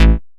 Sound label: Bass A, Offbeat Bass; VEC1; Club